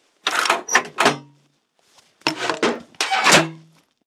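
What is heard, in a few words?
weapon missile launcher reload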